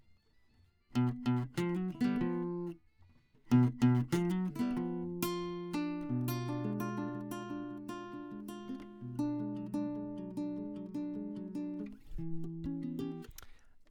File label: Classic Guitar